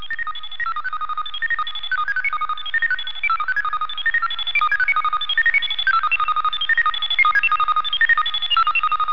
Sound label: scifi